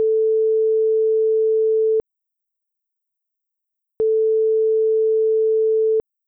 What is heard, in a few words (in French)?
telephone